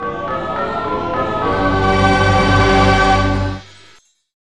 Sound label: Important Item Get; Zool Majou Tsukai Densetsu; n64